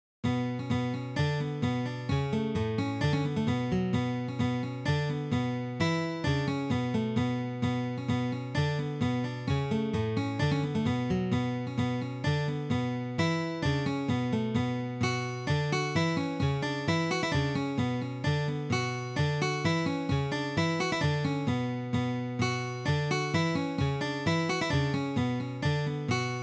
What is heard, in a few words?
Finnish Polka